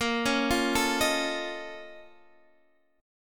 A sharp Minor Major 11th, A sharp, down, Guitar, Drop D